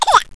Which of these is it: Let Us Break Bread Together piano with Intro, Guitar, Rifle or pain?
pain